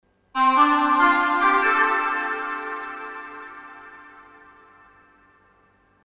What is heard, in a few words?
Iridium Vector Synth; Family Together KA